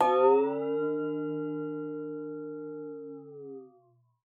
WATER, PERC; Xsample VOL-9

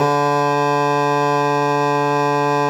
BASS SHAWM; SoundBytes - Early Patches

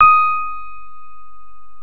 CP-70, CP-70 El.Grand; E-MU Producer Series Vol. 2 – More Studio Essentials

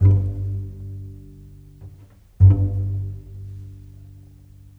Bass Cello, Cinematic; Sonic Foundry (Sony Creative Software); Orchestral Series VOL-3; Cinematic 16

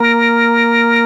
ORG Vox A#, Continental Vox Wah Bar; Roland LCDP09 Keys of the 60s and 70s 1

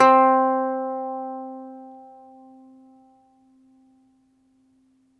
BALALAIKA, Russian Strings

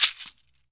SHAKER, Heart of Africa; Spectrasonics

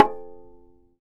tar; Roland - LA Composer vol. 3

